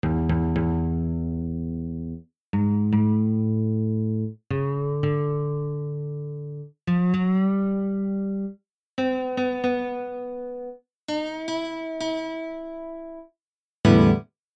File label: music tuneup